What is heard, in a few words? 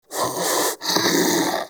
Armadillo Vocal Breathe